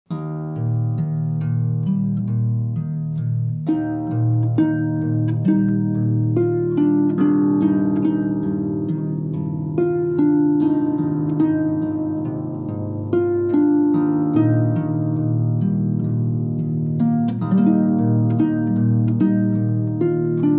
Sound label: Harpists; Classical